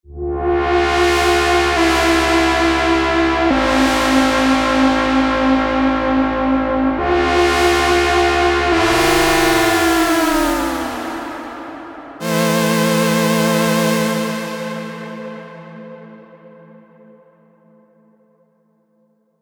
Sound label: Minimal; Kontakt; revealed diva techno pads vol 2